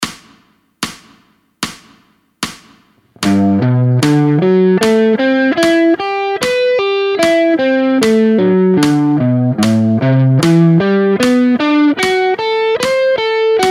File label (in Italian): Arpeggi Modali Ionico SOL slow